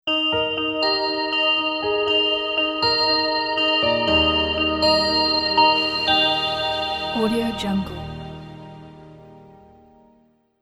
podcast opening closing sting

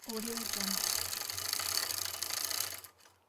bicycle gears

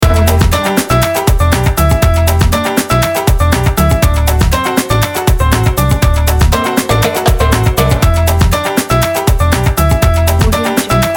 latin loop; soul-prod

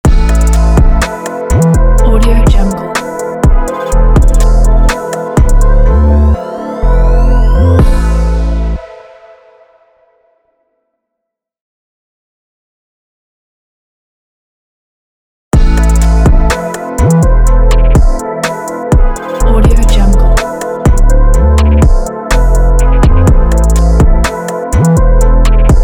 dark cold hiphop logo

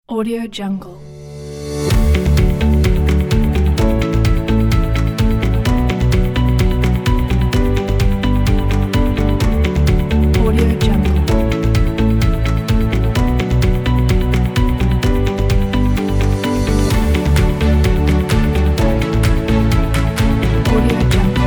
uplifting intro corporate

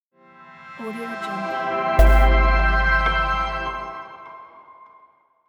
inspiration logo

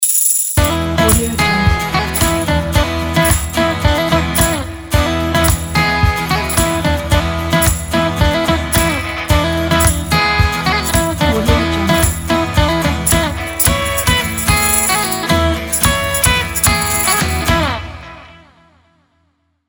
upbeat acoustic podcast intro

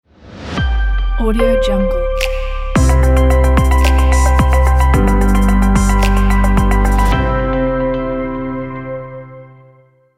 tech logo

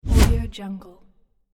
fast whoosh; konstantin

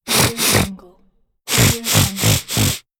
snuffle nose

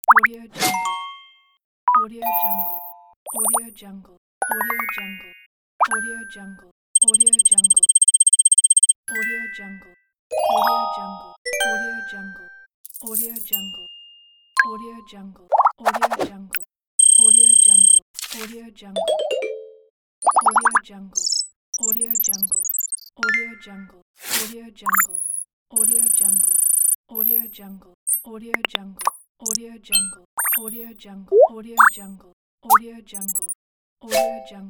infographic technology sound effects pack

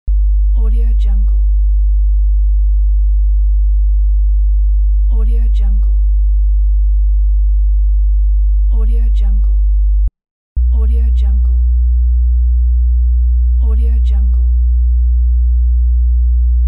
subbass test sounds

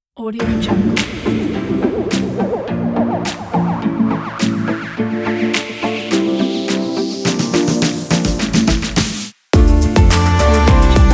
summer uplifting latin dance; lazaoaza

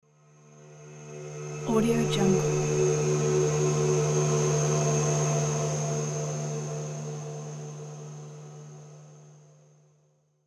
horror transition

